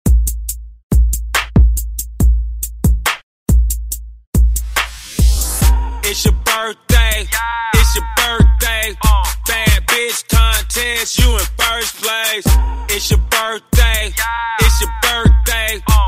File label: birthday song